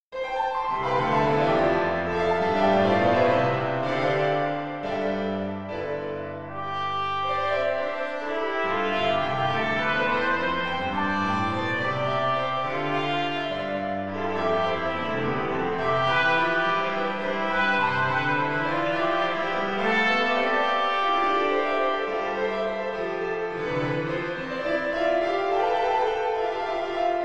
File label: Sonatina Trumpet Piano